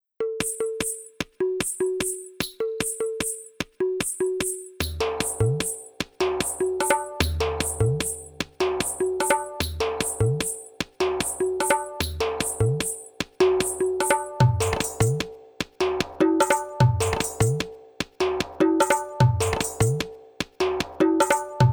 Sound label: rajasthani mand